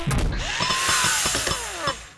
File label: Mining Laser End